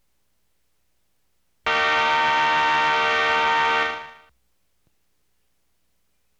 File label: alarm; keio